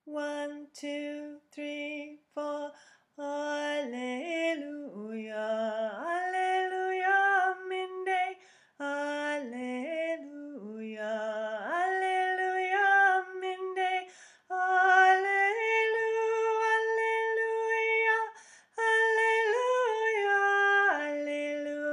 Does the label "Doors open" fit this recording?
No